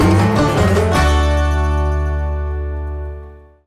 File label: Level Up; The Oregon Trail American Settler